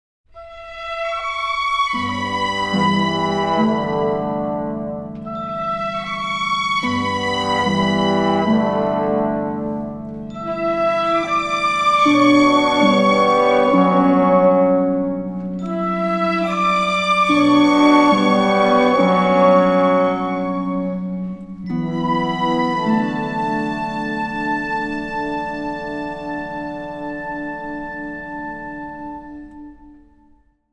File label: GameOver; Greak Memories of Azur; ps4 ps5 switch windows xbox one xbox series xs